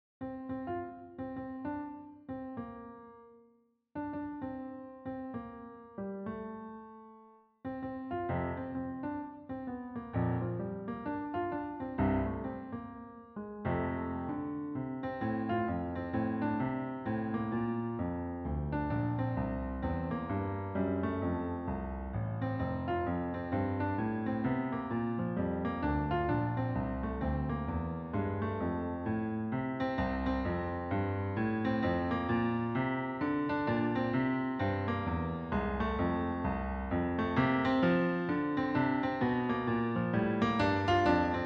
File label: solo piano